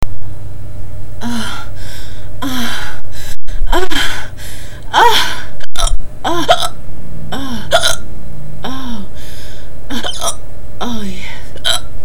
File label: Orgasm and Hiccups louder